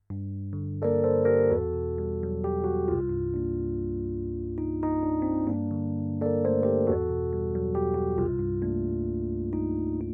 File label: Fender Rhodes, Preamp